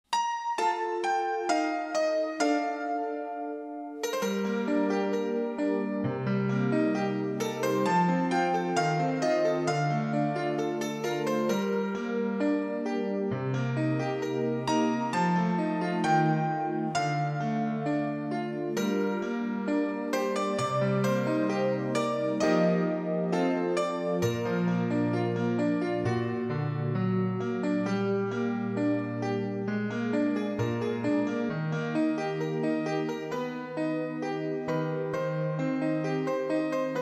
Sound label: brass minus